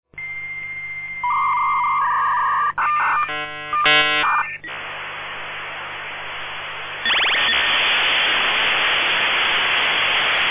v34 ambient odd, sound of modems

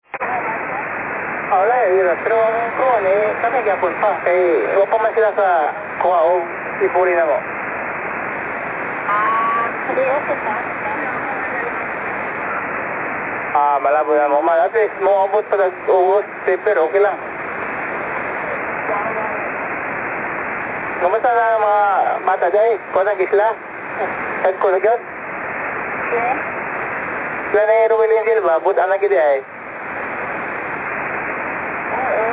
Other Pirates; fishing

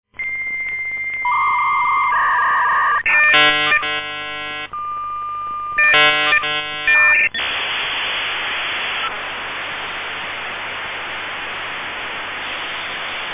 v90 interrupted, sound of modems

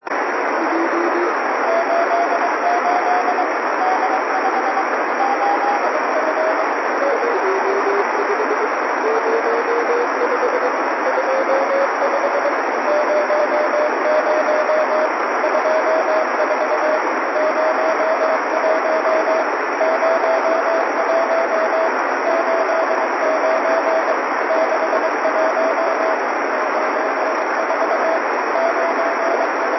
fo-29 jas2 cw, Satellite Recordings; 11:45am Pass; I-Com IC-R20

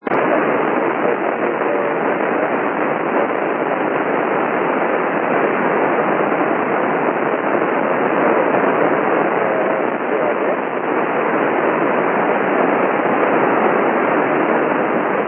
ao-51 echo, Satellite Recordings; 7:18am Pass; I-Com IC-R20; I-Com IC-R20 DC to Daylight "wideband" reciever

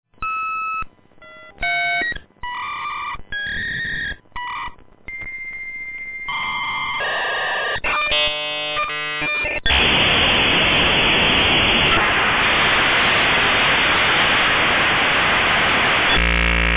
v90 rockwell conexant, sound of modems

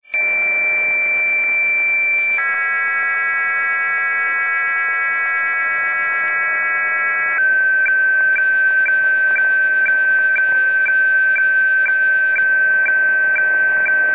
FAX Tuning Tone, Start Signal and Phasing Pips

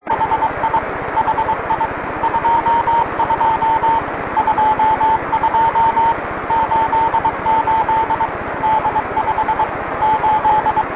fo-29 rawsample, oldsatellite; jas 2; 10:40pm Pass Sample; I-Com IC-R20

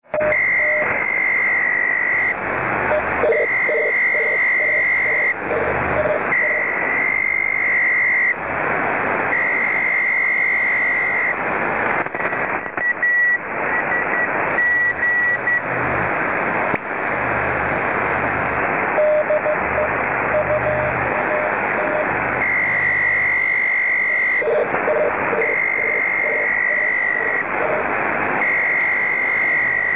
A GLN and PACTOR station working Side by Side

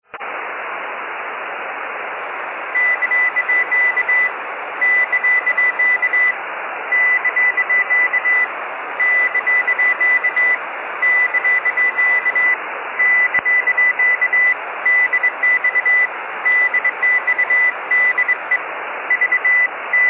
Amateur Radio CQ Call using Morse; HF

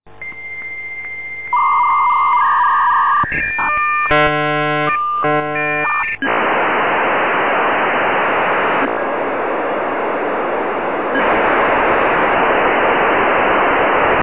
v34 slow sync, sound of modems

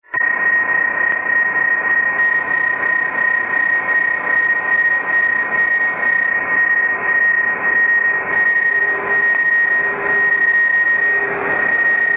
Other Unusual Interference

